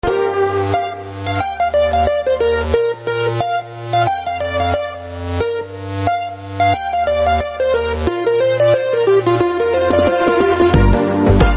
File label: Instrumental Tone